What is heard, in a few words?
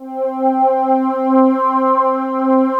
JX sweep C4